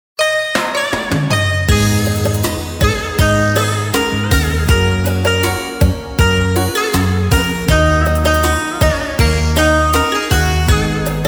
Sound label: beat, playback